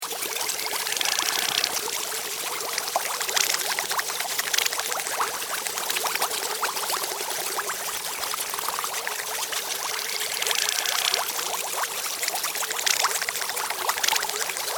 Steampunk Laboratory, Bubbles, Beakers, Science Fiction, Loop; 5.1